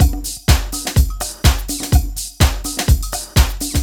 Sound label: DRM, LOOPS 125, House; Best Service ProSamples vol.10 - House; AKAI